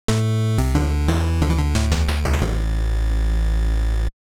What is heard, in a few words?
game over; super stone legacy